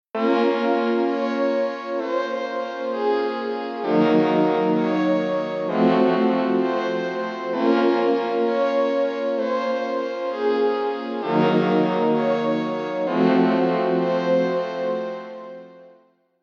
PAD

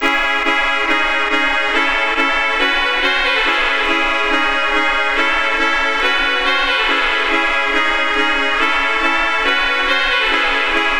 Drill

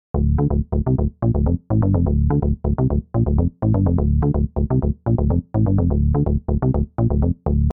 Bass Loop D min; Unit Rcrds

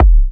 Kick; Unit Rcrds

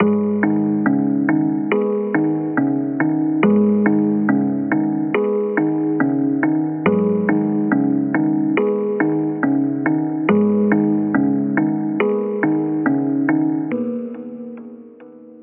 Drill

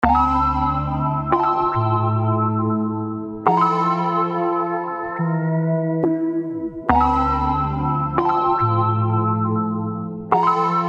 Melody Loop

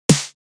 Snare; Unit Rcrds

